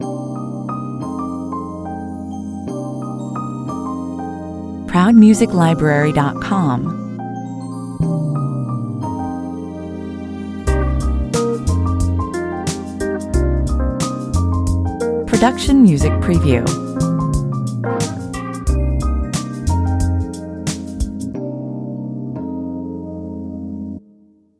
cocktail lounge on hold 90bpm

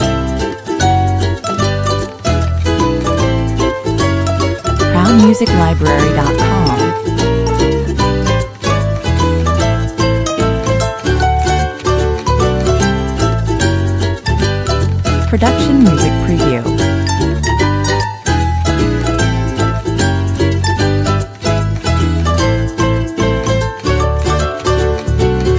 ukulele joy loop

